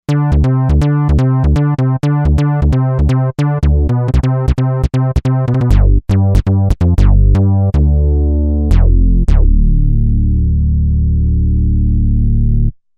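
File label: Bass Pulse Smack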